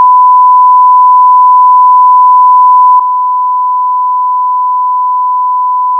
1000Hzの音データ/基準音と低減音 [会話音[女性の声]など]; ペアガラス対応

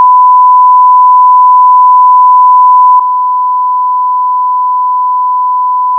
1000Hzの音データ/基準音と低減音 [会話音[女性の声]など]; ペアガラス対応

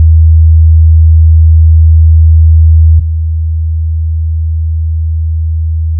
80Hzの音データ/基準音と低減音 [低音のいびきや大型犬の鳴き声など]; ペアガラス対応

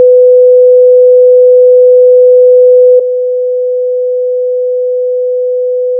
500Hzの音データ/基準音と低減音 [会話音[男性の声]など]; ペアガラス対応

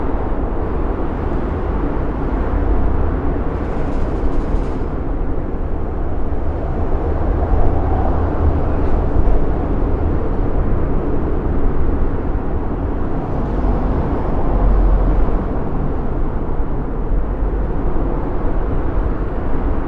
高速道路での防音効果の検証, 高速道路脇で 防音箱を使った比較録音; ペアガラス対応; 道路騒音に対しての防音効果

